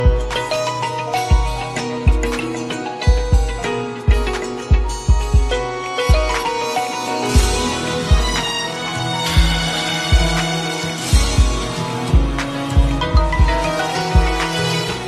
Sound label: classical samsung ringtone